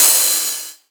Ride; Vengeance Samples; VEE Cymbals

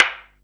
Minimal Perc; Minimal Percussion

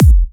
128BPM Kick; Vengeance Samples; VFH2 128BPM Automata Kit

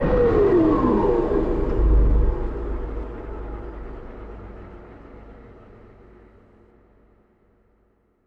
VFX Atmos

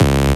Synth Bass; Vengeance Samples; VEE Synth Shots

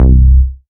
Bass C, Offbeat Bass

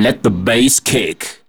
Vocal Phrases; Vengeance Samples; Vocals

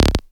Synth Shots; Vengeance Samples

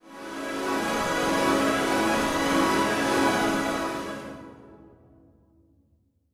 Tonal FX C#

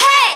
Vocals; Vengeance Samples; Special Sounds

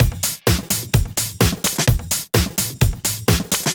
Housebeats 128BPM; Vengeance Samples

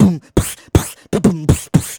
Beatbox Loops, Human Beatbox

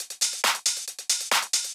137BPM Loop; Drums & Perc; Vengeance Samples; Universe Kit; D minor

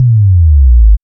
FX Bass Sweep; 140 BPM; Vengeance Samples; Song Kits; Take Me Away

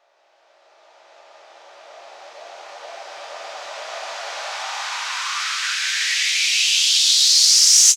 FX Uplifter

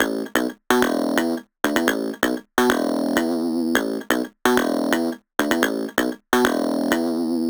128BPM Melody; Vengeance Samples; Doubleride Kit

